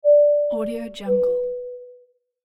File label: airplane seatbelt sign beep; inspectorj